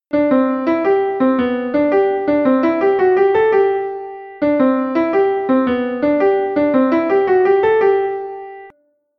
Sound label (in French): couplet piano; Couplet avec piano; Couplet 1 – Chanté